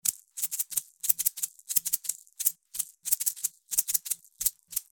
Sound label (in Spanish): maracas huevo; reciclar tocar